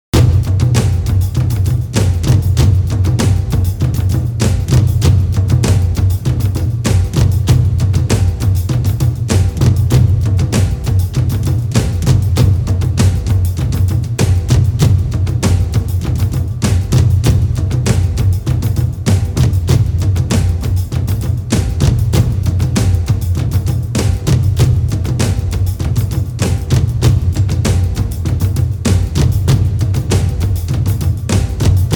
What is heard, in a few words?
Taiko